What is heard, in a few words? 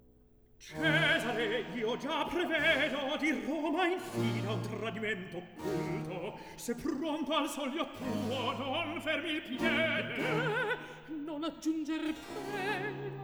Recitativo; Atto II Scena 8; Decio, Cleonilla, Ottone; Antonio Vivaldi; Ottone in villa (2010)